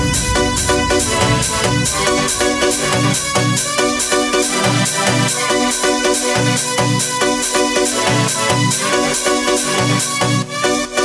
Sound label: Vivo V11 Pro Ringtone